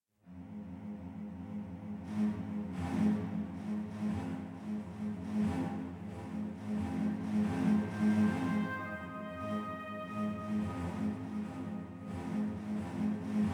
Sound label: night jungle; Men of Valor